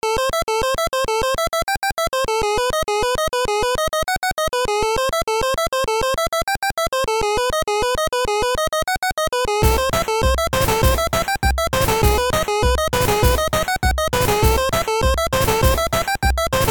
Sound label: chiptune, Random Chiptune Music